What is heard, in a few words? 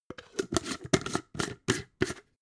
jar glass plastic close